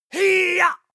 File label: male attack shout long; schreie; menschen